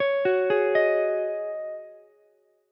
Db Suspended 2nd Suspended 4th, Ukulele, Dbsus2sus4 chord; arpeggiated; JGuitar